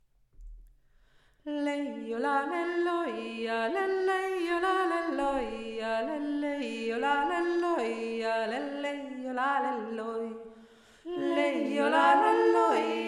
yoik dreistimmig; Lei o la le lo ja le lei o la le lo ja, / le le o la le lo ja le le jo la le loi

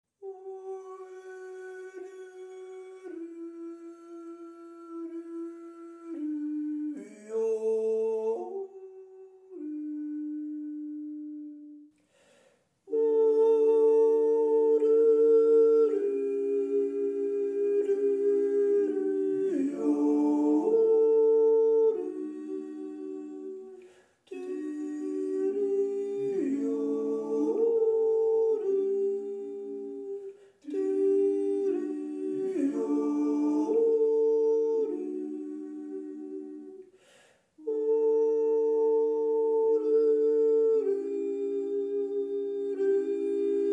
2 stimme; jaresend

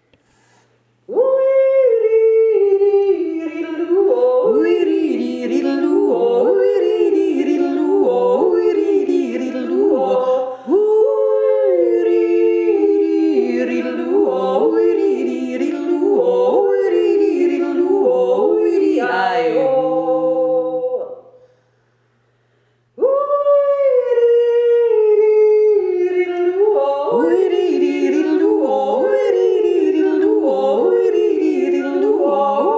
zweistimmen

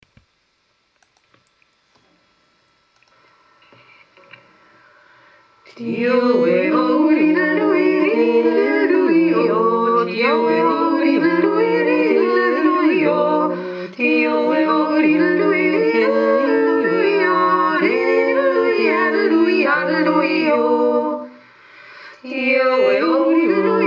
3stimmig

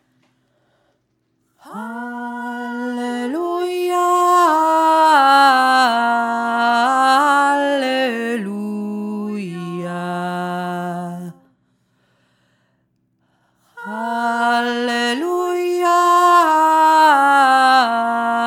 halleluja untere stimme

